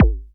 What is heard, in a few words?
Kicks, Elektron MachineDrum; SPS1 MKII